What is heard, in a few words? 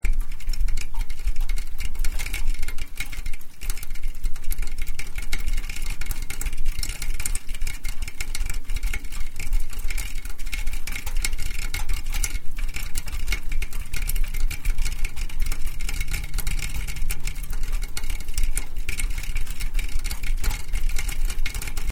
melting snow; February, 2005